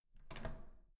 door handle down

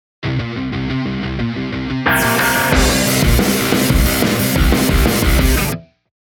heavy guitar riff

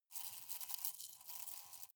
hornet hum glass

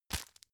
tissue grab

